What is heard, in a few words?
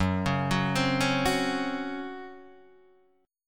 C sharp Major 7th Suspended 4th F sharp